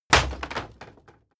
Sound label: wood pallet drop